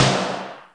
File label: Snare, Alesis D4fx; Drums